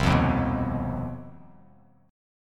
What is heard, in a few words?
C Minor Major 7th Double Flat 5th C down Bass Standard; strummed; JGuitar